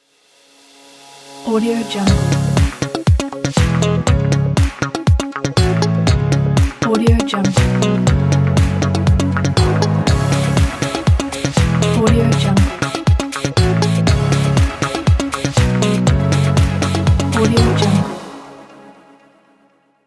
pop funk logo

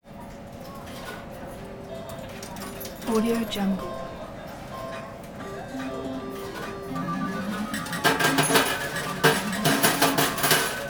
las vegas casino

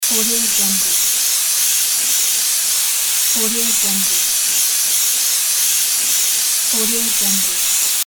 air spray loop

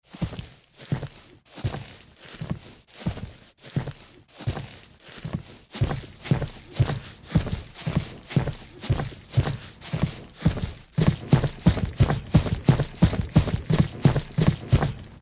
soldier run walk crouch on grass; pack of soldier run walk crouch on grass; medartimus

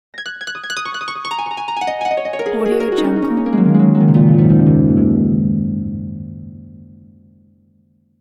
magic harp; klassikua

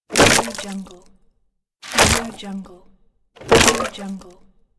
smashing wood

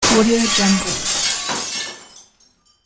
large glass smash; sound-ideas

